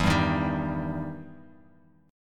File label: E Minor Major 7th E down; EmM7 strummed